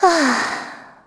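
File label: Vox Sigh, Korean; kingsraid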